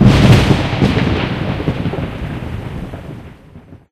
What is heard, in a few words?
Thunder